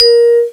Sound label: VIB LO, MALLETS; FAIRLIGHT CMI IIX